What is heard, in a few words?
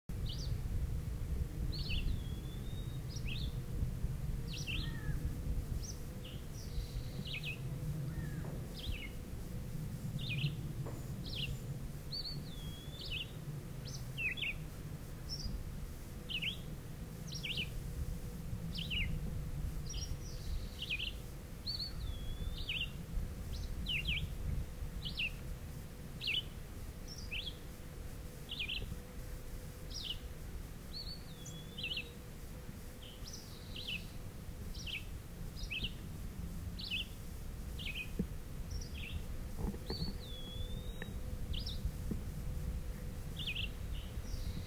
Red-eyed Vireo; birds; Indian Springs; The Birds of Indian Springs; audio cassette tape